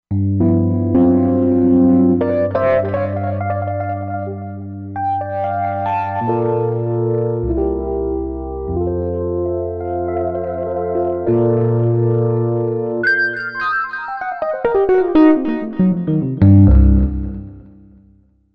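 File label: Touch Drive, synth piano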